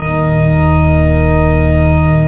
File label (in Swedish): KYRKOORGEL